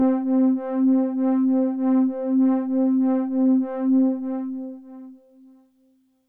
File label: chords, yamaha reface cs